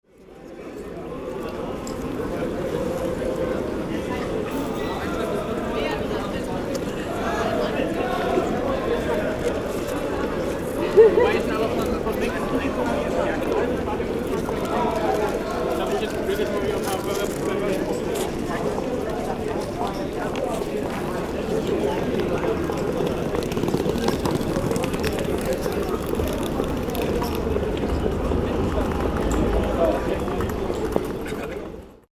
railway station singing soccer fans

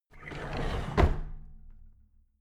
drawer big squeak close heavy

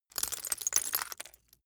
glass debris fall sequence short

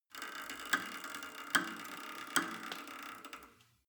cable machine creak down short

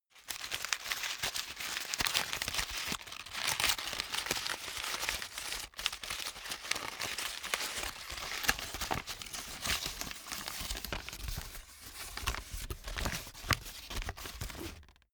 sheet flatten

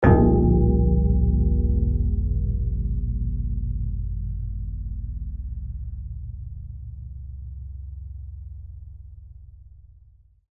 metallic impact

